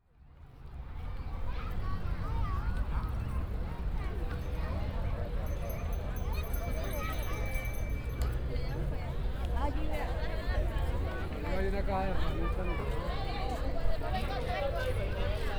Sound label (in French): bogota tercer milenio marche aux puces